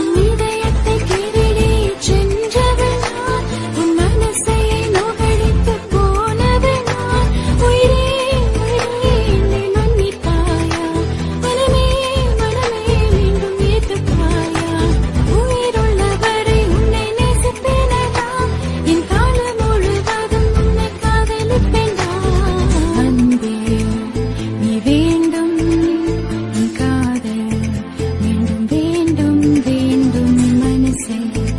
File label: Female Love Feeling Tamil Bgm Ringtone; ringtones